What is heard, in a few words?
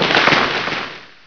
debris, weapons; hl2